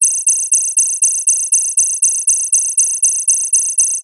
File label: science fiction small digital computer alarm warning beeps medium urgent